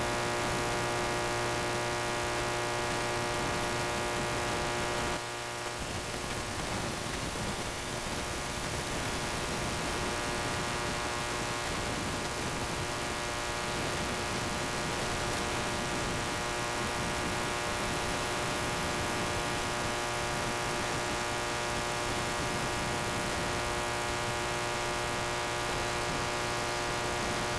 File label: sound, Boat; SeaSnake